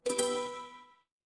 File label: feedback achievement